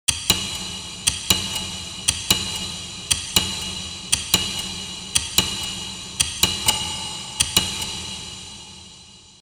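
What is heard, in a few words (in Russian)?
напряжение фильма часы секундная стрелка ходьба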